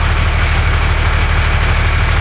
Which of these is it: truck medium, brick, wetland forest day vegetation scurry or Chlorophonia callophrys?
truck medium